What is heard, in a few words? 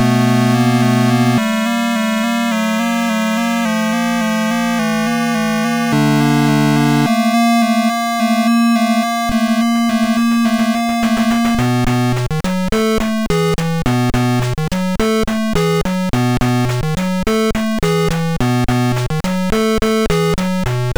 msx main theme; ghostbusters